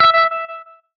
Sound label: Hitsound menu note